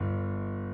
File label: key; keySounds